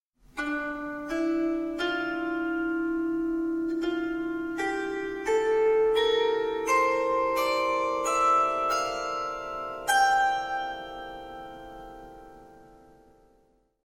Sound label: scale